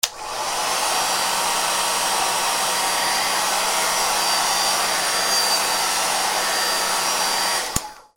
BATHROOM

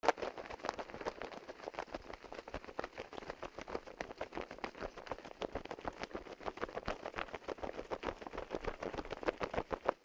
BIRDS

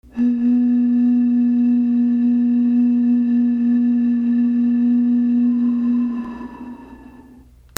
WHISTLES

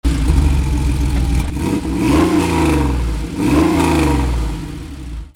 CARS

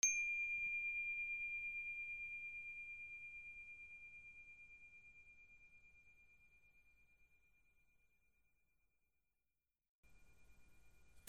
BELLS

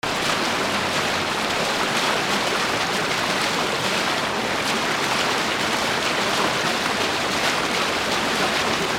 WATER

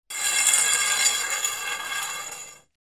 FRICTION

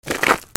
FOOTFALL

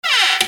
SQUEAKS

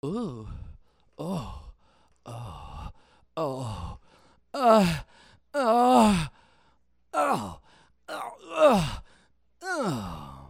PEOPLE

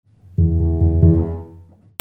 MUSICAL

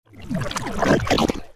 ANIMALS

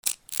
FIRE

